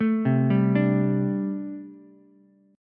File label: A Minor 11th A Ukulele C6, Chord; Am11 arpeggiated; JGuitar